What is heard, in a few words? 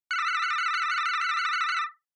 Censor Bleep Synth, Mid